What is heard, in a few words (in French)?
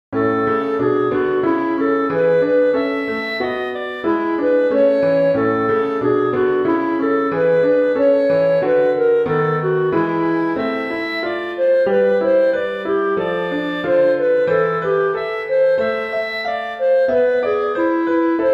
avec piano